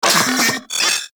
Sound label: Vocal Alerted; Warframe; Corpus; Arisoctrats; Mini Moa